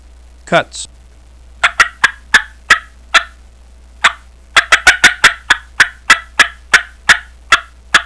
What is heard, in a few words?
cutts